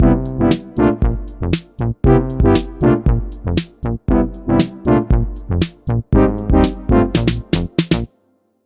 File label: Bgm Result Loop, 117; Brix; Perkpipo; Brixity